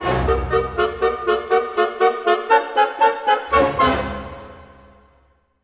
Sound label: Orchestral Loops; 128 VERTIGO